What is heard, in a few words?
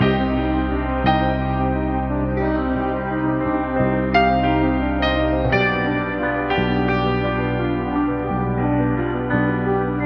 piano, sad